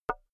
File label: wood board knock light